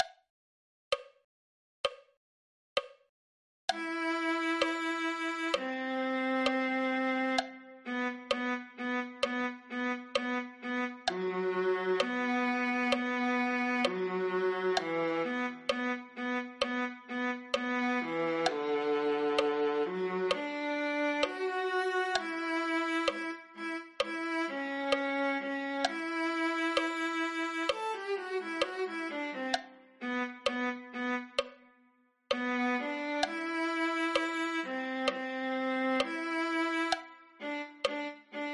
viola

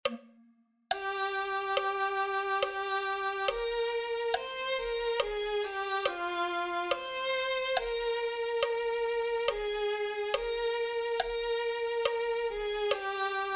violin

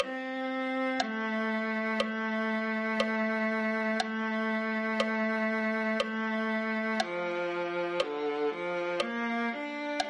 viola

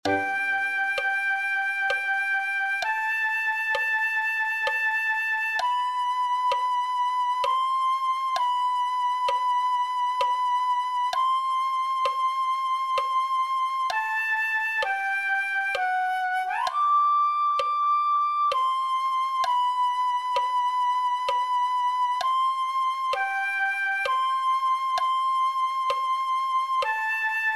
flute

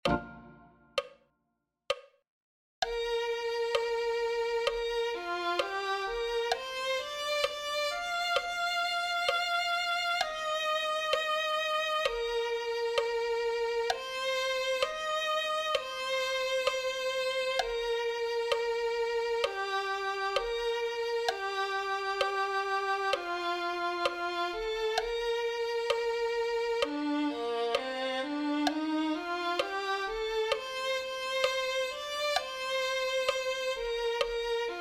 violin

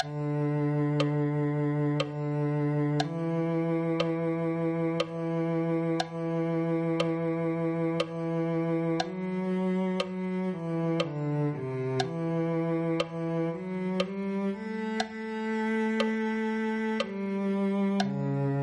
cello

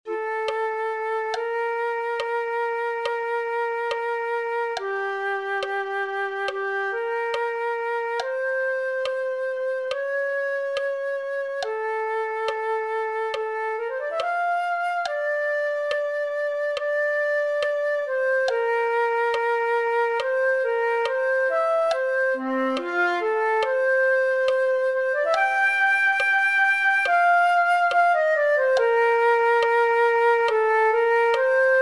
flute

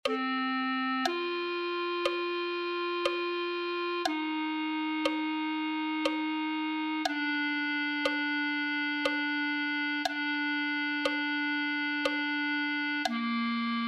clarinet

